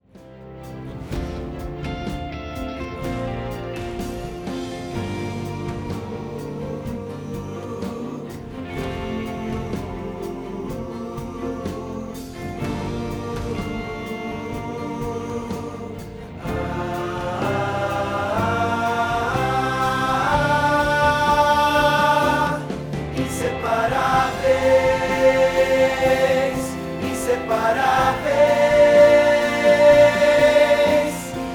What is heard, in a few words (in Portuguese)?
tenor